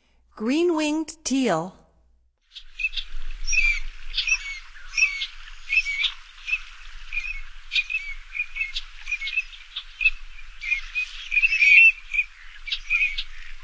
Green winged Teal; North America; Stokes

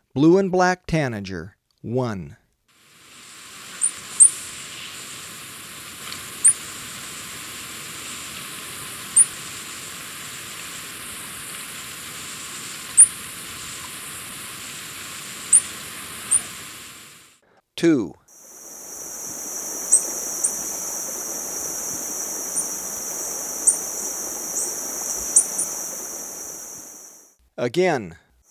Blue-And-Black Tanager, Birds of Ecuador; S. America

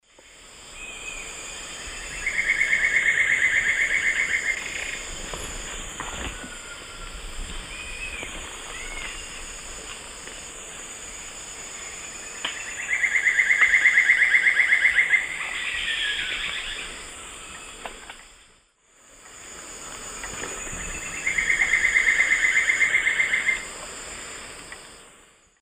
Dendrocolaptes picumnus, songs 2 birds; S. America; Eastern Lowlands

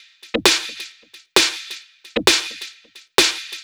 Snare Loop, Dance Beats

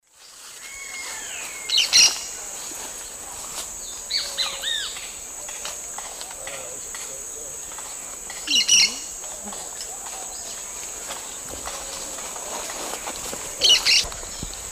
Brotogeris sanctithomae, calls; S. America; Eastern Lowlands

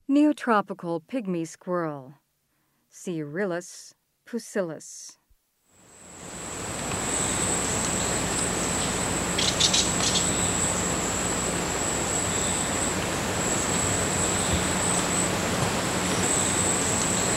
Neotropical Pygmy Squirrel; S. America

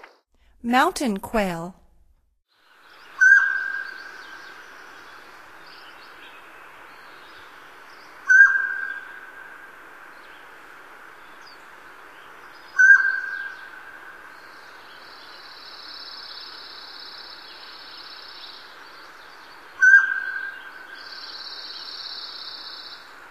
Mountain Quail; North America; Stokes

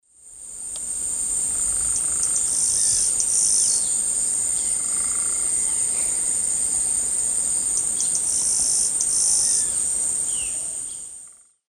Ammodramus aurifrons, song; S. America; Eastern Lowlands

